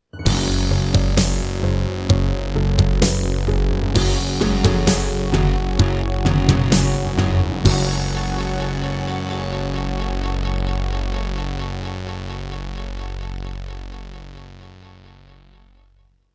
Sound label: Introduction, Serdaco Dreamblaster S2; Doom (Sigil)